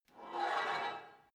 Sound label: take off weight barbell quick, fitnessstudio